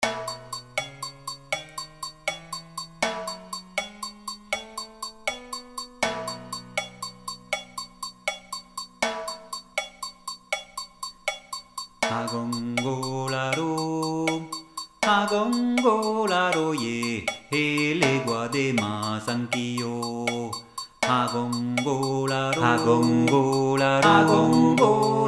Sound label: yoruba loop